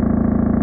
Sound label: bike